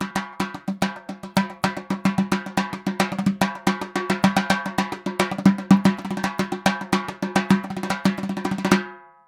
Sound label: dhol loop, 110; perc construction loops; SEISMIC Drum Sample Pack; Slate